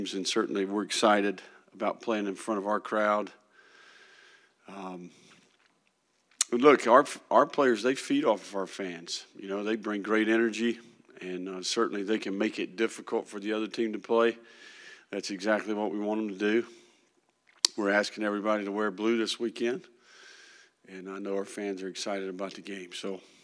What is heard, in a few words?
Crowd